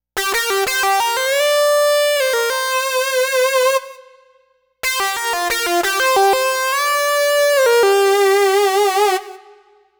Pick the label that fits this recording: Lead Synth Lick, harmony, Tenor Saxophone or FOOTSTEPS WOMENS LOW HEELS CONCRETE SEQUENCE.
Lead Synth Lick